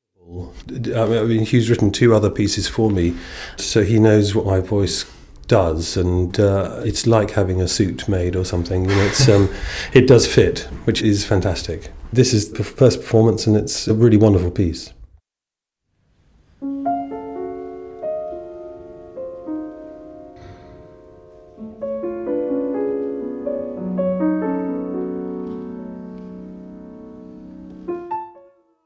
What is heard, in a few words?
four sonnets for voice and piano; Huw Watkins; The Melancholy Year